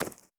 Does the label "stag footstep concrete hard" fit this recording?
Yes